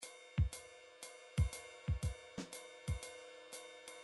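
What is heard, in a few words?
midi drums jazz slow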